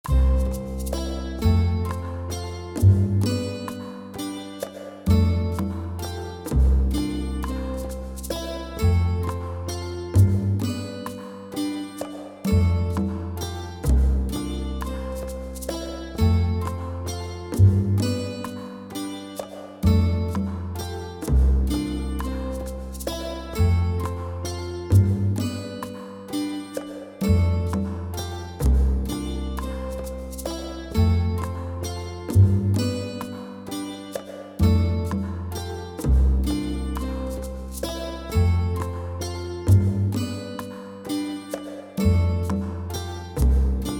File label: HaloVerb Plate; Metric Halo v4; Cuba NKI